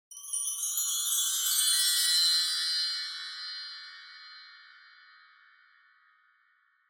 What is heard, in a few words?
Wind Chimes Reverb Up Low